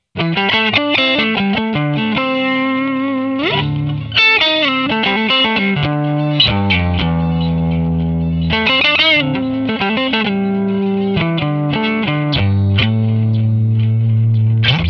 Delay Echo

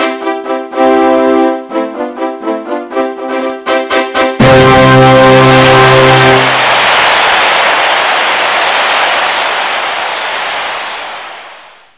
trophy

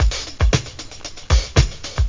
breakbeat; World of Sound; instruments; beats

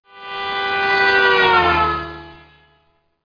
dopler; Microsoft Graphics Studio Greetings; Microsoft 1998

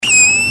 kitten; cat; animals

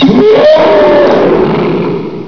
Mino Lock Greek; Loony Labyrinth 1.02 Demo

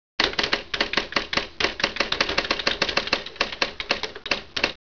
typing

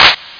hall clap; m1hallclap

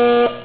error Buzz; TacOps

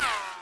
bullet; The Sum of All Fears

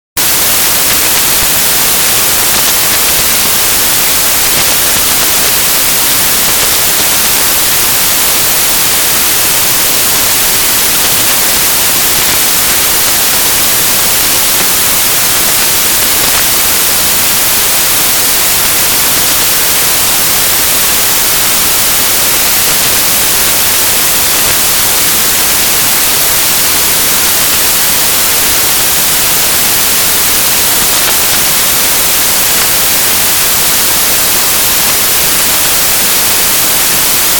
Ford Thunderbird - Sound

